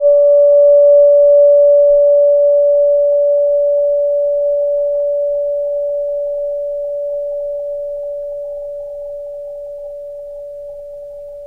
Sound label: chime